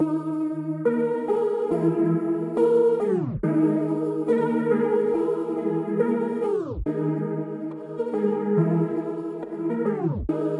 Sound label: beat; NICHO